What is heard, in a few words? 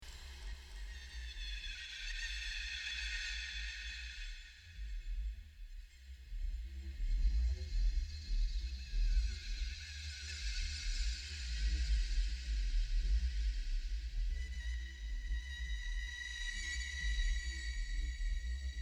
Distant bird cries